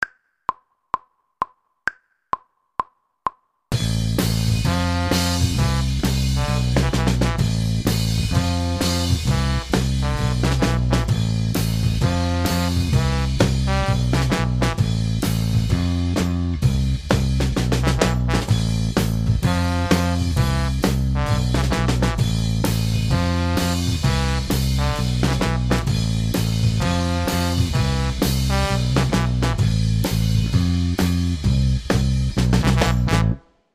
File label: trombone section